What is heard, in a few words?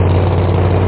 buggy